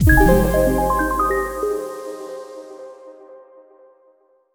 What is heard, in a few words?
level complete F; color zen gamerip 2013